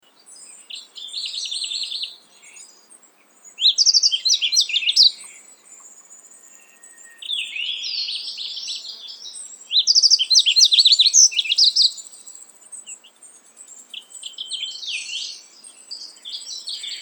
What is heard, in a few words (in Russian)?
ovsyanka zheltogorlaya emberiza elegans; zvuki prirodi